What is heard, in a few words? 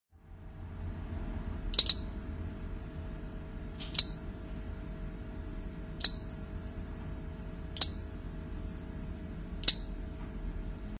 Mantella crocea